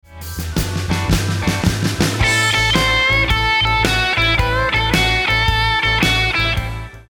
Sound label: Licks Lick, guitar